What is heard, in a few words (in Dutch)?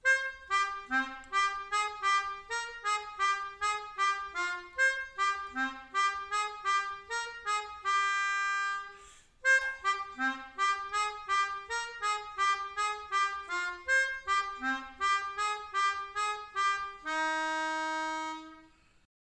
Mijn Melodie, Melodica